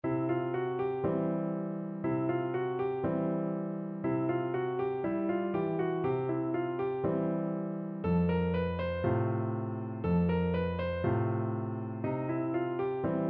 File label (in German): blues c dur klavierbegleitung